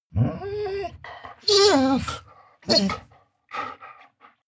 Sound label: dog whine low; natur-tiere; hunde